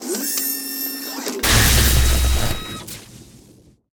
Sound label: grasp; CosmicRage